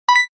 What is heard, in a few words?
pokemon exclamation mark sound effect